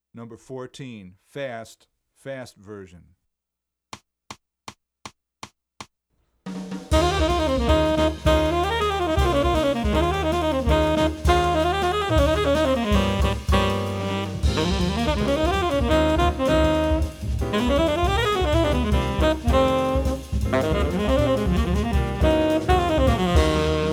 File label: Fast Fast Version, trumpet, jazz; 14 Jazz and Funk Etudes; CM Level 8 Solos (jazz)